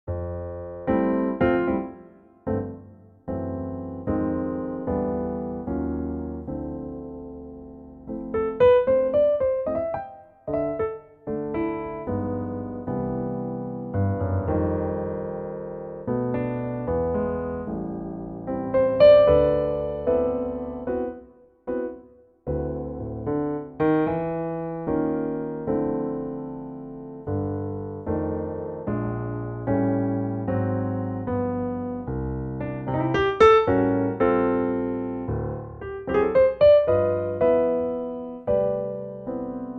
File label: C piano; Pianotrak; key - C - vocal range - F# to B; Gorgeous piano only arrangement, at a nice tempo, and perfect for a cool vocal.